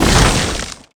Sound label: Block